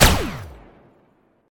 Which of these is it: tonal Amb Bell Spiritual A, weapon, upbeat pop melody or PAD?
weapon